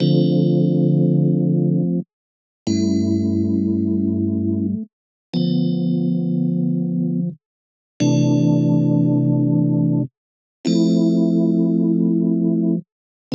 Dm90 Organ; LaFollia

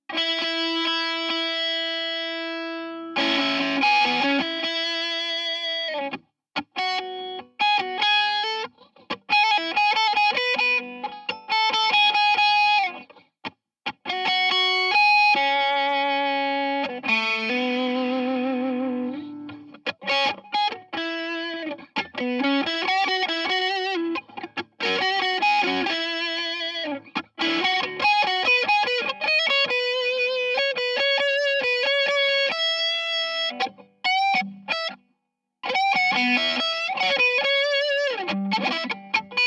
E140 GTR Blue Sky Impro

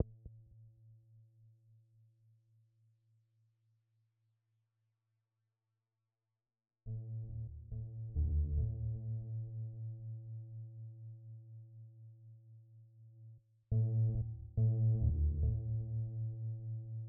Buzzy Pluck

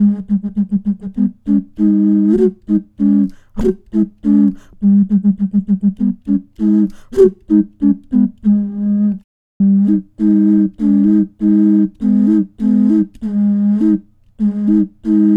Harmonic Ocarina Melody (Key of G3)

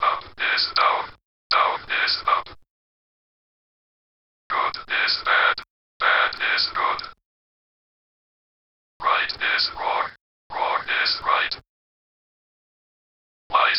Waltz; Zenboy1955; Gaslight Waltz

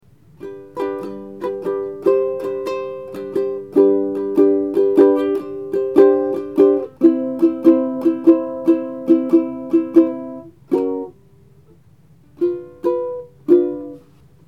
Raw Uke

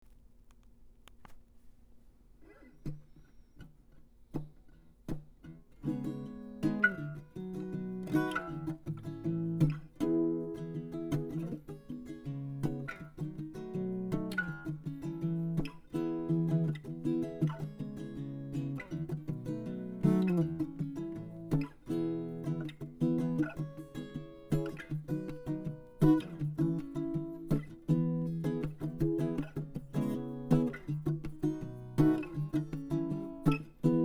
Acoustic Guitar

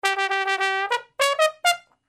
brass loop

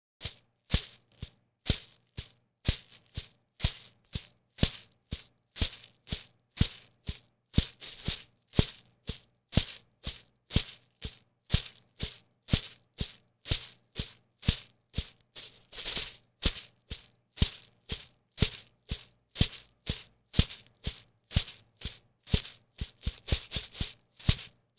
little guitar sample

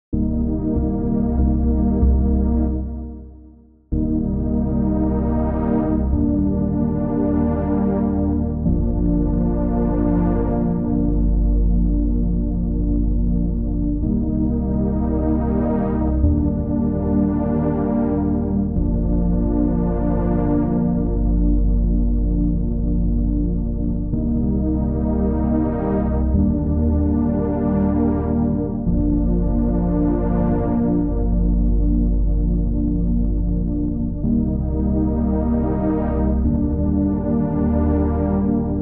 Sirens

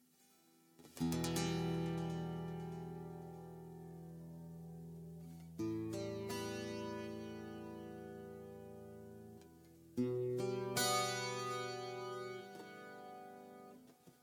Em100 Echo GTR impro

